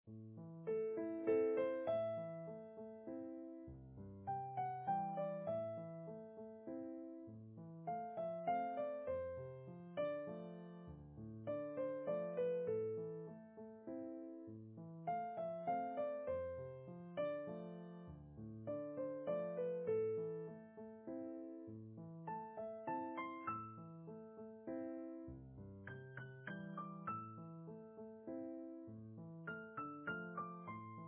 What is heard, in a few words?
easy piano